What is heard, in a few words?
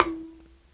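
BENT MARIMBA E 4; Heart of Africa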